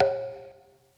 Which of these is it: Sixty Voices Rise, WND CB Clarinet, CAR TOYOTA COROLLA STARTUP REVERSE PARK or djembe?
djembe